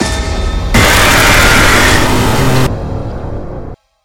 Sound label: attack; springbonnie